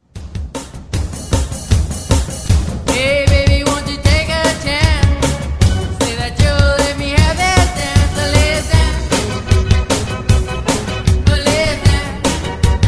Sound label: 60s ReDrum 153 Bpm; DJDOLLS